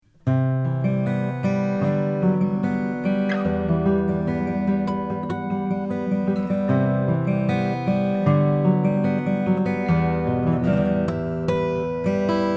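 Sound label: greven 000hb sunken sinker brazilian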